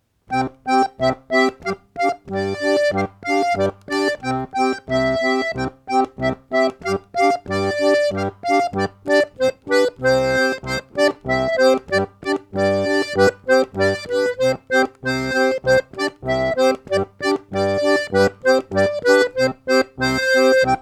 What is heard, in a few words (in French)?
polka